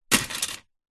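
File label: leisure toy drop into toy box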